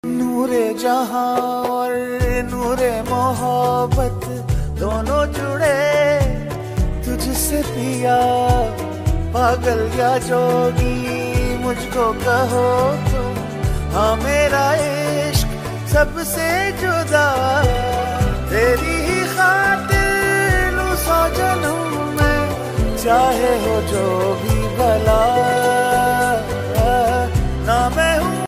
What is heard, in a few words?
romantic ringtone; ishq murshid drama; isq mursid show